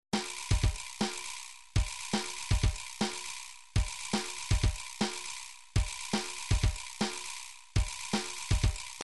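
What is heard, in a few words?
drums pattern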